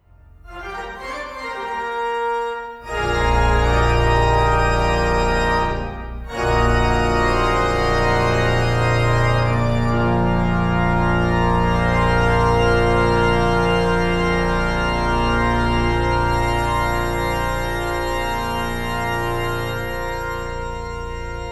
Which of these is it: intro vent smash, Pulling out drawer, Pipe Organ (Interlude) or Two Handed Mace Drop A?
Pipe Organ (Interlude)